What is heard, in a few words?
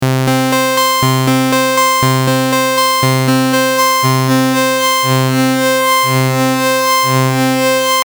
attack